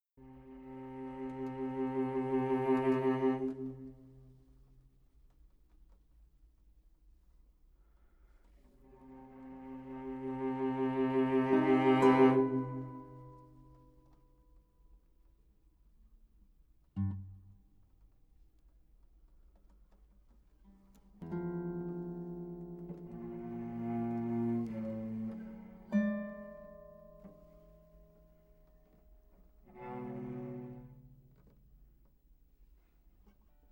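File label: Forest Meadow 1am (guitar + cello)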